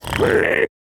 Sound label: piglin retreat